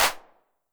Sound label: HAND CLAP, 909 Reverb Pitch Width